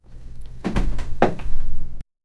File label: walk food step of a, warehouse